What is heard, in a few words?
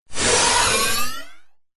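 science fiction cyberpunk robot surge power short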